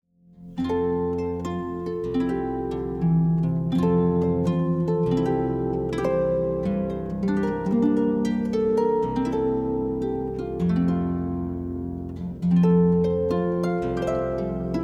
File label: Air From Water Music, harp